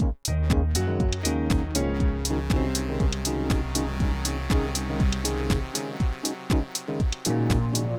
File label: bombo groove; bombogroove 11